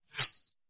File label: Arrow Whistle Bys, Medium; Arrow Zing By Close Up. - Archery; bow & arrow